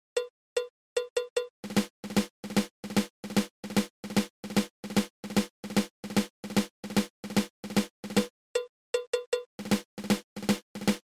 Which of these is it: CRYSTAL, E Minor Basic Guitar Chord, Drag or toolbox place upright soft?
Drag